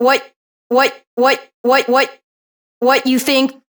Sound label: House Loops, Vocal Loops House 128BPM